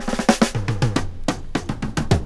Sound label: DRMROLL; E-Lab; X Static Goldmine 2